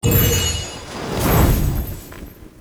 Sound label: multiplier Increase Lightning; netent